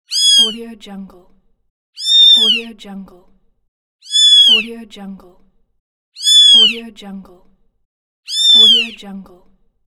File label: kitten squeaks; teldrassil